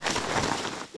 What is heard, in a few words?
Weasel walk